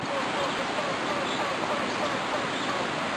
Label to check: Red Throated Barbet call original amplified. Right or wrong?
Right